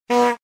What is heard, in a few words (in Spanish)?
bocina humor